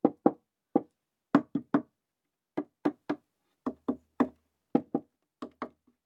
przybijani desek

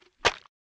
chwytanie dziobem ptakobestia

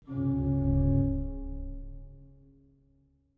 organy C#1

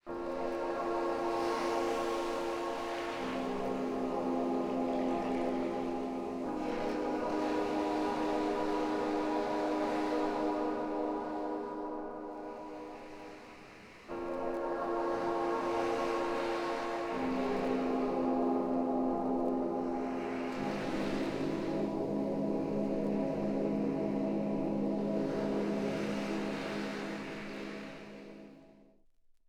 podklad plynie tratwa do brzegu dlugi

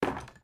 rzucanie deski

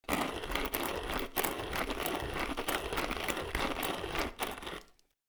mielenie mlynek

